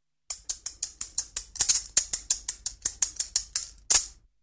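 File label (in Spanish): Maracas, Percusión; Idiófonos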